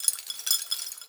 Chandelier Crystal Rattle; Foley - Rattles

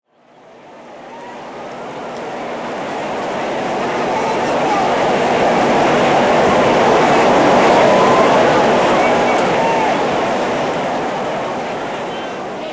Crowd Ambience; Pitch Black Audio Pong

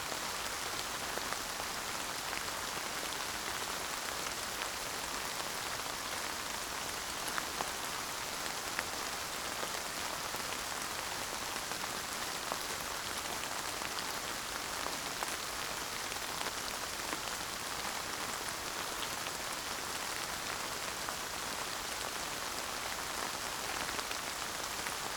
Rain consistently moderate; boom library; Thunder And Rain

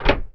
BUTTON Short Dry; mono; Universal Sound FX

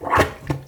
Suction Toilet Plunger; SFX Kit; Foley - Misc

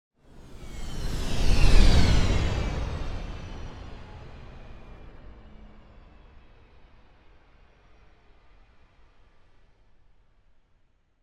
Space Ship By - Slow Down L to R; Hollywood Edge; sound designer's toolkit v2

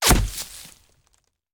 Bullet Impact; Sound Ideas; Guns

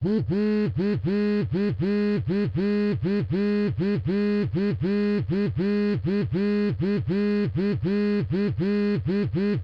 phone vib staccato; devices accessories utilities; NS Studios - ultra sound collection

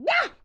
grandma knife waaa; Blind Drive

